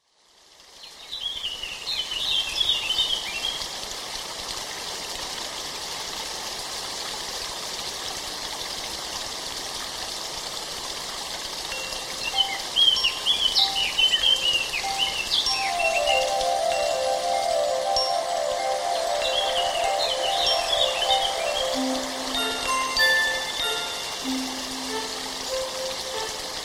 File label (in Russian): Volshebnye zvuki prirody Vesna ruchi penie ptic